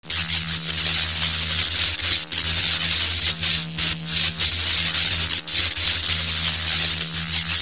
electric

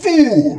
pain; cooler

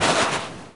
curtain, doors

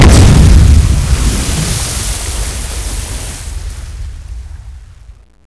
explode water

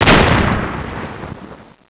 tnt boom

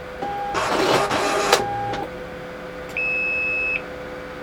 copier run

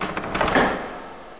creak

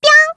jump; nepgear

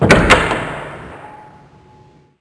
door open

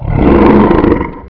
alert, panther eye

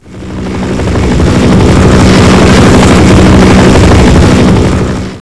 chin fly by, chinook

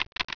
keyboard

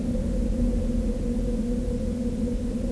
night wind

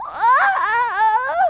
death; chiaotzu

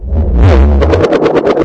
alert, strider; scmod

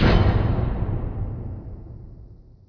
metal chunk

